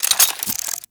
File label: Laser Aim Rifle Reload; Warframe; Grineer